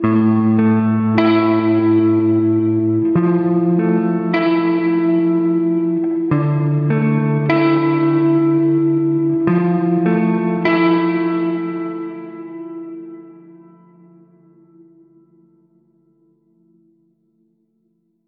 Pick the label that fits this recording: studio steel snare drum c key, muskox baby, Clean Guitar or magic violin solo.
Clean Guitar